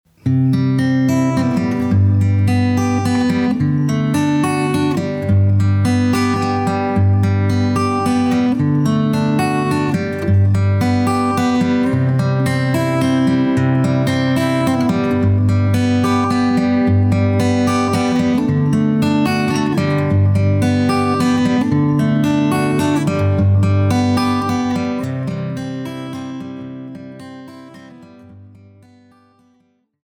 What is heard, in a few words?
BACKGROUND NO HARMONIES